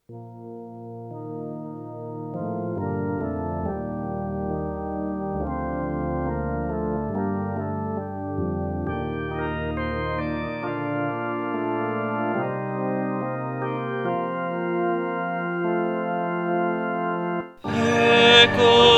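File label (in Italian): Ecco il mio servo tenor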